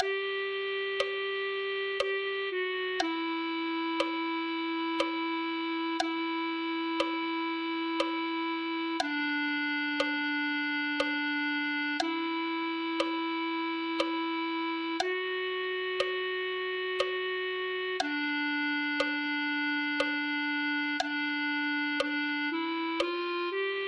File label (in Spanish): clarinet1